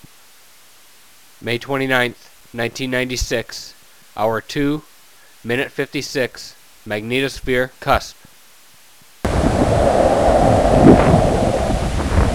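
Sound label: magnetic cusp, plasma wave; polar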